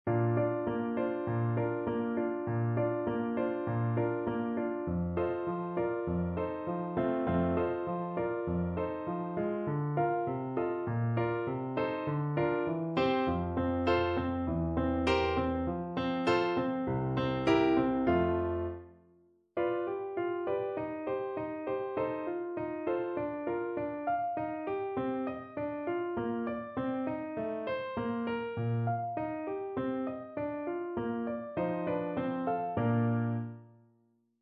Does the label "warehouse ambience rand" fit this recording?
No